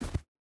footstep boots snow jump land; Rust

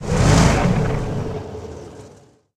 t64a engine load, tanks; War Thunder

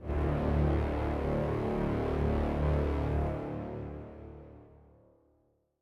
music interactive; World of Tanks

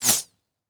bullet flyby pistol close; Rust

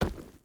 footstep rubber boots forest jump land; Rust

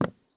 walk wood; Escape from Tarkov

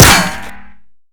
Projectile Sounds; Red Orchestra Ostfront 41-45

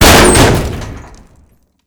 door metal kick open; Call of Duty Modern Warfare; Doors

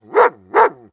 dog; World of Tanks Blitz; hangar 9 may

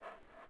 Animal Breath Large; Civ4 warlords

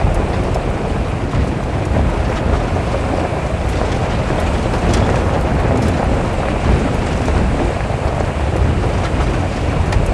Truck Move; DCS

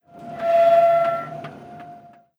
pumpjack pump up; Rust

